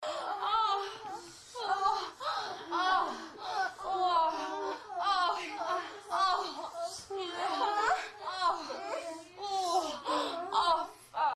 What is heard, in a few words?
many sex, moans; The Witcher Hunt